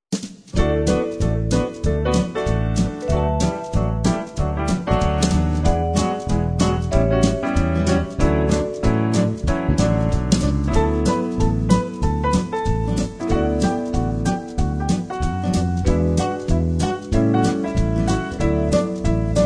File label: music music music